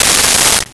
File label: Hydraulics, Mechanical